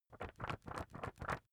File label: magazine wobble soft